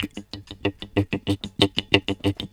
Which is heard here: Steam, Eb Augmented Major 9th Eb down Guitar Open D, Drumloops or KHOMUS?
KHOMUS